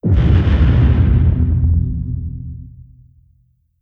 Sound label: distance adv kinectic hit, weapons; Stellaris